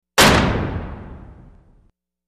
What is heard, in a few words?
Industrial Oven Door; appliances